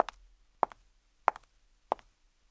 chuze zenska; Mafie 1

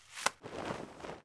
vzal schoval; Mafie 1

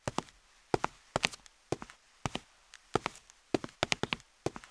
kulhá; samkulha; Mafie 1

